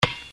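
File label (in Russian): отскок мяча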